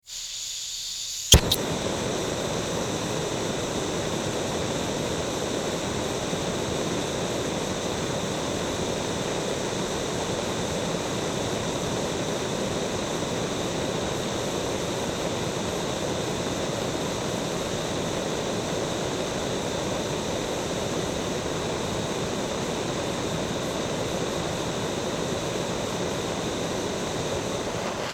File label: Torch